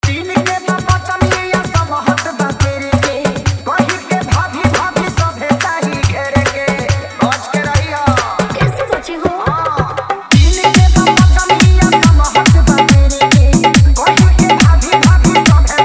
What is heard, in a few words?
Holi Dj Song Hard Dholki Mix